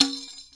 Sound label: arabic perc, Arabic Percussion Kit